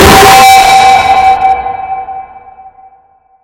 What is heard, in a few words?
bite; beepteebops